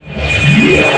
spawning alien

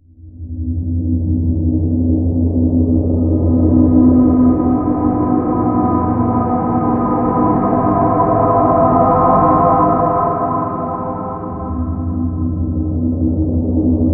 menu

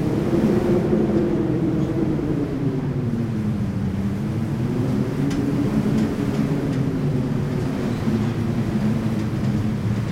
wind; atmosphere; atmospheric engine

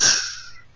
door opening

